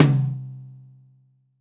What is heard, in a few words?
Brush Tom